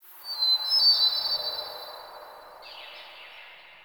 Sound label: Wetland Forest Hermit Thrush